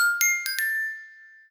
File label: Happy; SE Manzai#03 (SE111 Man Happy)